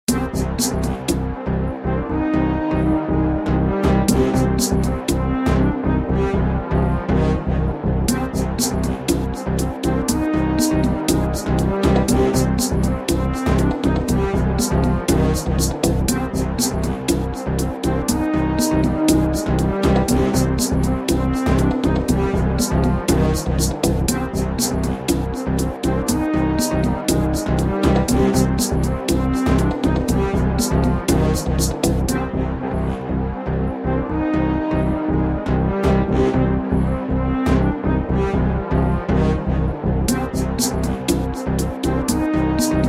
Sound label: Epic Cinematic Electronic Futuristic Action Movie Theme